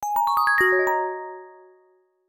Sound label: swingup start prism; LG Wing 5G Verizon